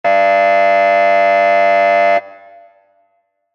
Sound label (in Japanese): 劇場やホールの開演ブザー 短め リバーブ有り; 戏剧音效